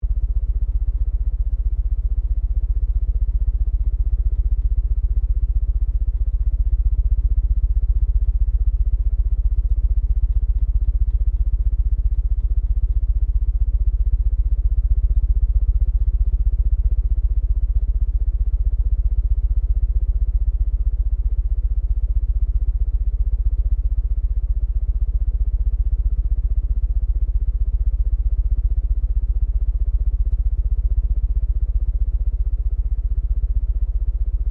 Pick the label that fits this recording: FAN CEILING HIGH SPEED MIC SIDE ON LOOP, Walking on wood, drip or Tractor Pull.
FAN CEILING HIGH SPEED MIC SIDE ON LOOP